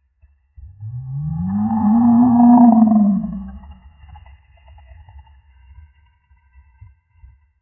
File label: realistic cinematic jaguar